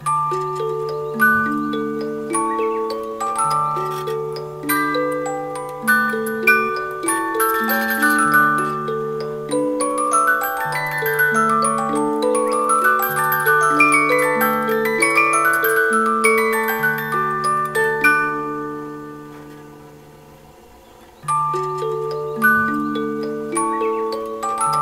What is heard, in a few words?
music box chimes; chime collector